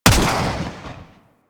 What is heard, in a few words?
Swarm Missile Shot; Enemies; Warframe; Eidolon; Jetpack Marine